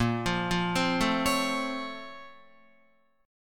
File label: A sharp Minor Major 9th, down, Guitar; A#mM9 arpeggiated; JGuitar